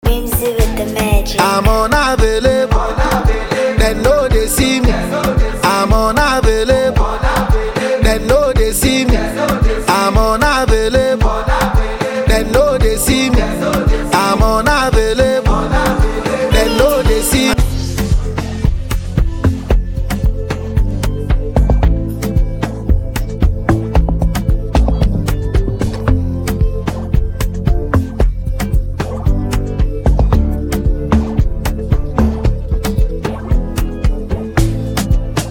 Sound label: Instrumental Hook Open Verse beat; workwithwhimzy